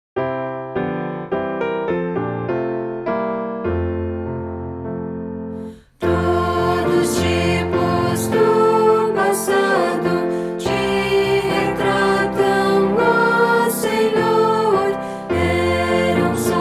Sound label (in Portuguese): voz piano